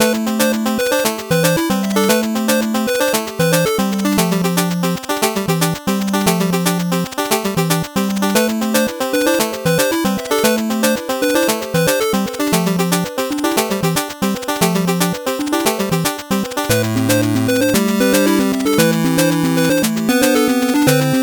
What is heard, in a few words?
8bit bgm normal